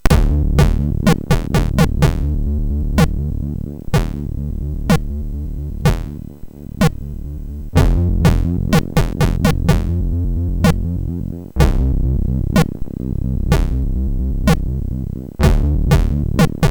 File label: Test Tune, MOS6581R4